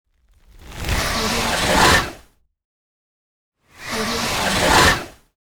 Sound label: werewolf